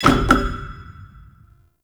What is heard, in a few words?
Five Fates Result Full House; Cetus Vendors